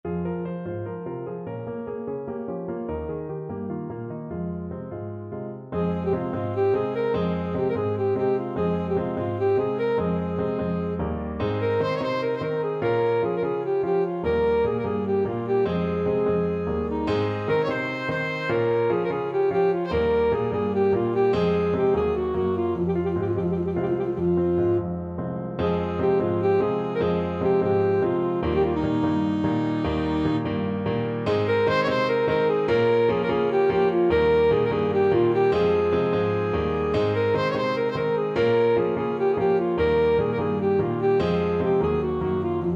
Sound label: ASAX, saxophone